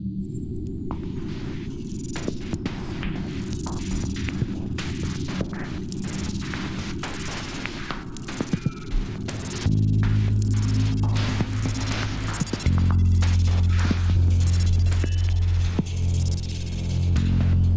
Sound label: Metal Planet Rhythm and Atmos, Metal: rhythmic percussion, stuttering and fizzing metalic screeching: